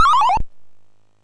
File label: sid MOS6581R4